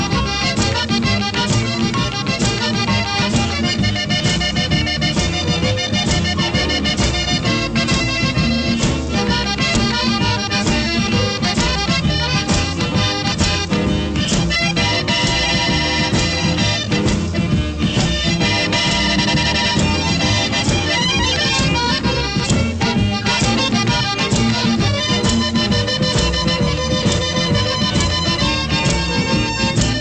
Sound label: blues